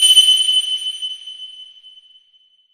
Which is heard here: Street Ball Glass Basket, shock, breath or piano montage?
shock